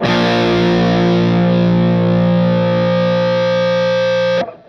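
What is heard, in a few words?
fuzz guitar vibrato; MAYFUZZ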